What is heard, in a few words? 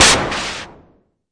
Arturia MiniBrute noiz